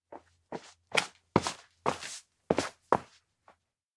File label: steps outdoor lakeside return; aino; anemoi